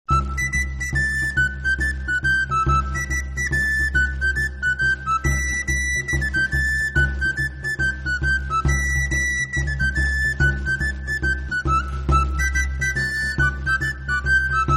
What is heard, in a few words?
galoubet